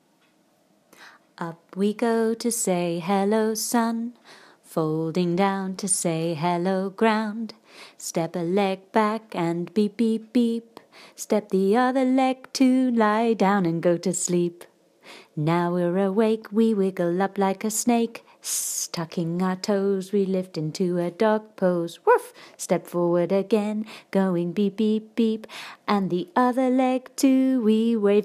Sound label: Sun Salute Song